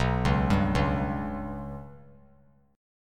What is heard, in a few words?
B Suspended 2nd Sharp 5th; Bsus2#5 arpeggiated; JGuitar